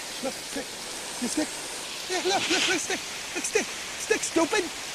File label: stick